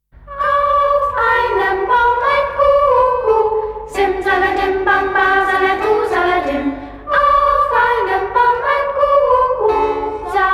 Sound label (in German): Spieluhrmelodien